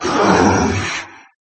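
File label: moan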